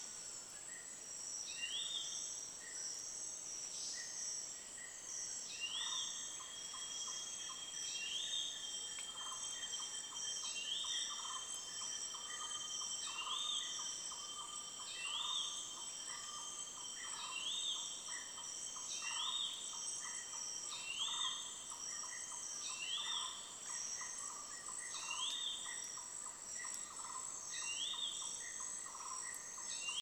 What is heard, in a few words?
Never Logged Protected Forest, Berau, Indonesia